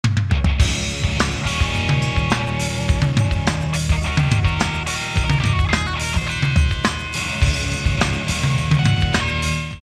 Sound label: hard rock